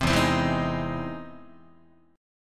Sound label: E Augmented Major 7th E down Guitar; E+M7 strummed; JGuitar